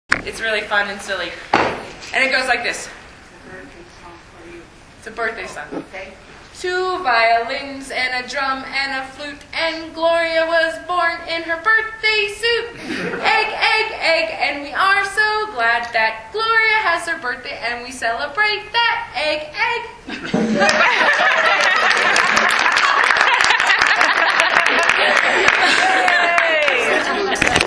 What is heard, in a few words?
Birthday song